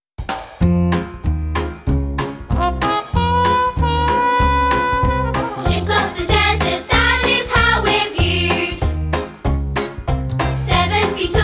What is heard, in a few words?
Vocal